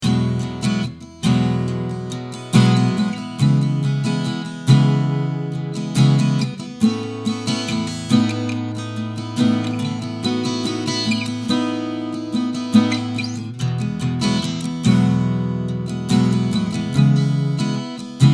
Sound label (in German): Clean Strumming Mikro